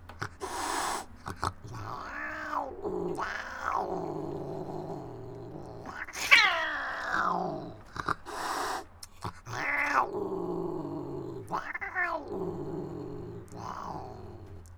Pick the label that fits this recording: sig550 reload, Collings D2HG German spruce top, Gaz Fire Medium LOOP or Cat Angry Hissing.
Cat Angry Hissing